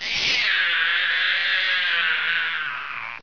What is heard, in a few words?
die, headcrab poison; hl2